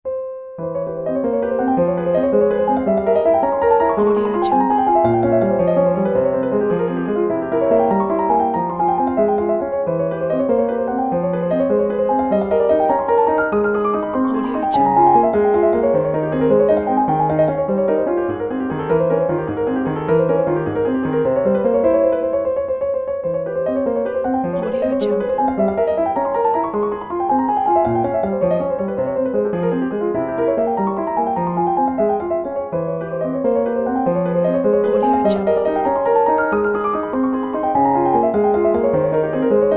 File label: piano etude in f minor; chopin; op 25 no 2; garsumene